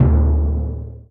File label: drum; crakbone